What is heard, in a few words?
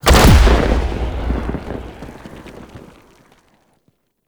Interior Orbital Station Pod Eject; Warframe; Tau